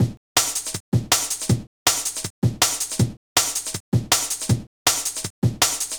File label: beat, drum & bass beats & breaks; Ebony; Sonic Foundry (Sony Creative Software); Fluid Dynamics Drum & Bass